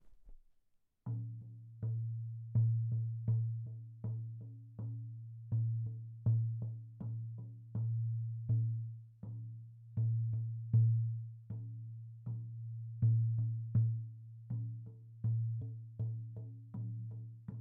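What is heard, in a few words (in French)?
soleil couchant, tambour chamanique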